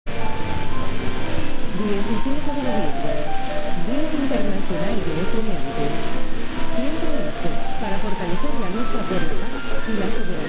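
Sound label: Bayamo Cuba, radio; R.Bayamo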